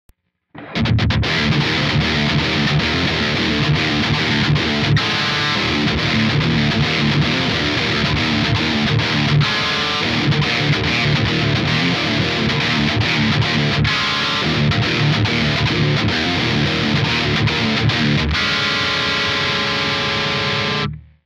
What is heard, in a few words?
metal